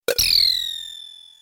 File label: Dropped spin